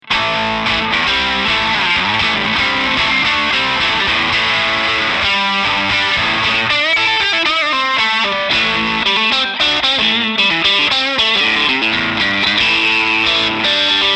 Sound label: dirt